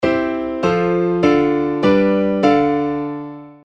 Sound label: Supertonic; Sequenz mit einem leicht irregulären Ende (Subdominantparallel-Akkord)